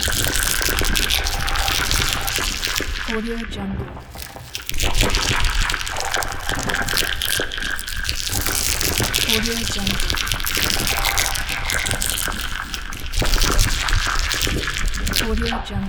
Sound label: alien insect swarm